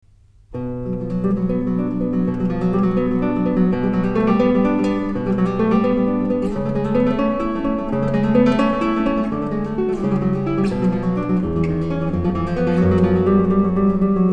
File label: Allegro Solemne